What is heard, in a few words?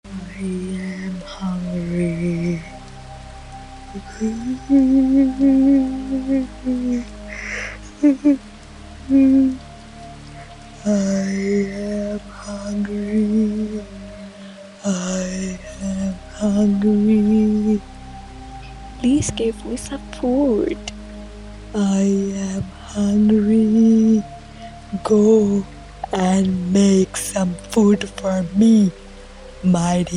creepy girl singing i'm hungry scary horror voice